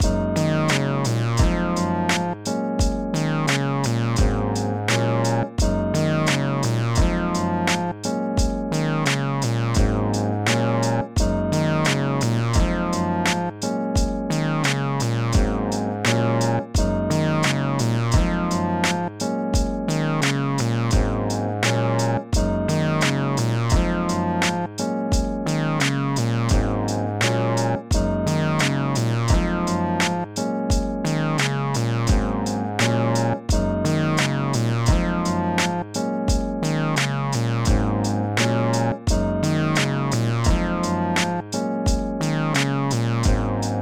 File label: Mellow Sad, Emotional Hiphop Beat; Genx Beats Experimental Vol. 2